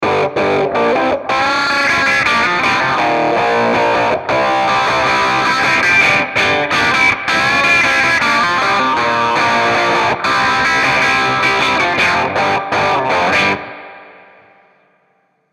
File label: MF Drive Filtermodulation